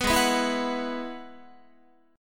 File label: Ab Minor 7th Sharp 5th Ab, down, Guitar, 4A, 4E, 5A, 5D, 5G sharp, 6C sharp; strummed; JGuitar